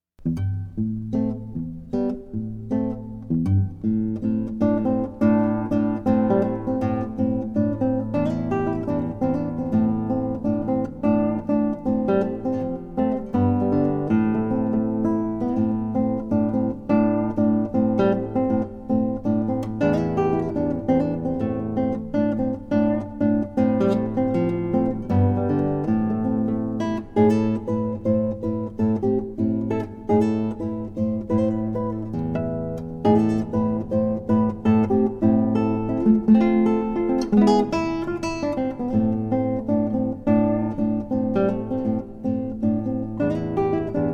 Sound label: guitar